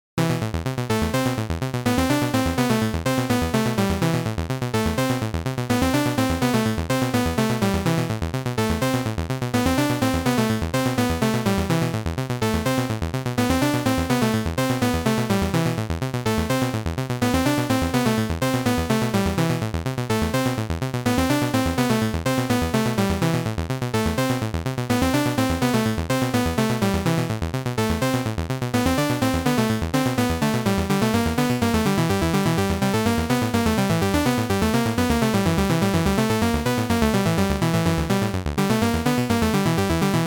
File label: simple gameboy music